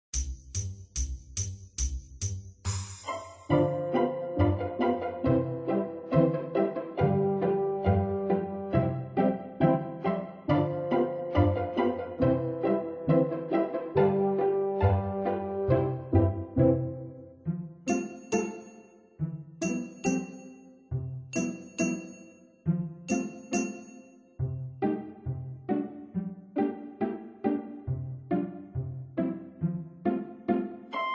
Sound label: Pizzicato Polka